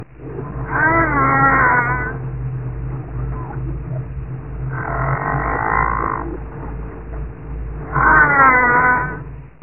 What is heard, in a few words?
graywhale